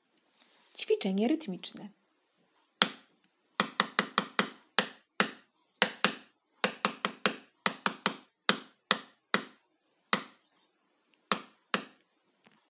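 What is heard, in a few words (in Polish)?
ćwiczenia rytmiczne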